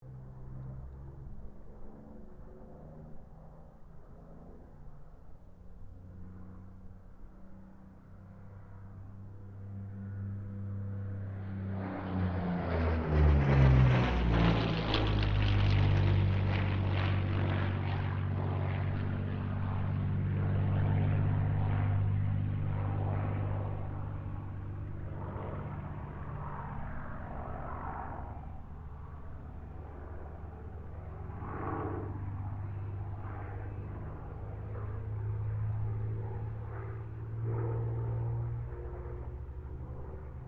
AIRCRAFT P-40 KITTYHAWK LOOP STEREO; P-40 Kittyhawk / Warhawk / Tomahawk; Airplanes; Vehicles

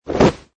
Body Fall, Human body falls on ground/floor; Body Sound Effects; Bodies (Sound Effects:Human)

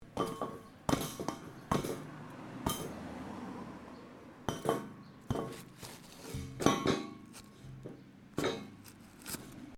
METAL PIPE IMPACT SMALLS; Materials

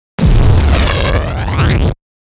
Hiss Bass Drop Static Fuzz Phase; Production Element Imaging Element Accent Transition; 5.1 STEREO MIX; Blastwave

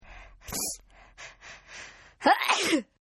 Sneeze Female Sniffy; Sneezing; Human

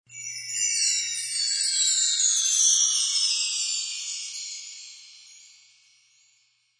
Windchime Loop; Percussion Loops

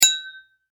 Foley Glass Toast Cheers Clink; Toasts; SFX Bible 6

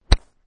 Golf Ball Hit; Lynne Music

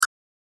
High Pitched Click Bleep; Electronic sound effect; Beep Sound Effects; beeps, bleeps, blips

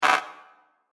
Droid Sound Robotic Grit, robot sound effects

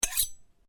Blade Swish, Sword

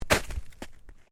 FOOTSTEPS SNEAKERS SHALLOW SNOW JUMP STEREO; Walking in sneakers or runners in light snow

